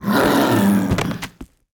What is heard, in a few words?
WAR BEAST DIE